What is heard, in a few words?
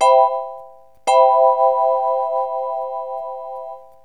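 major 7th, synth chime; cubase; CUBASIS; KEYBOARD; DX7VIBES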